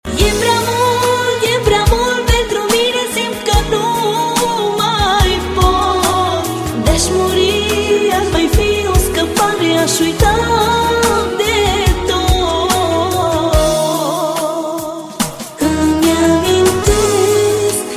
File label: Pop